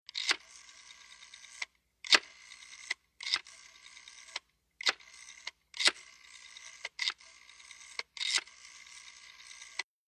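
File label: British Desk Tel Dial 7 Di, pulse rotary dialing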